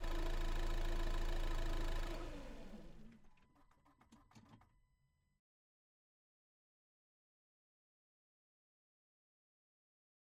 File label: Fiat RV - ONBRD - IDLE - Various - ENGINE - Right; Pole Position; Fiat-Sunlight T 67 2013; PZM